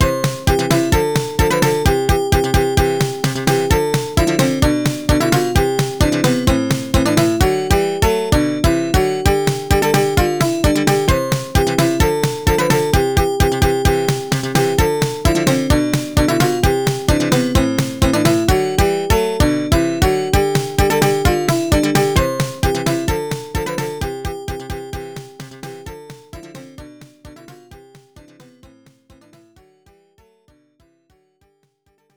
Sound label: Bonus Level; Super Mario 2 1998; Genesis